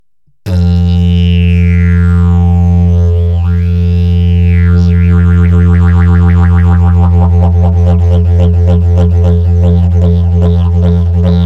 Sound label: didgeridoos